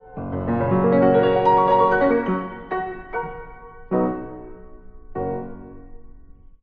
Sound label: cadences